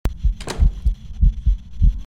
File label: door closed; phasmophobia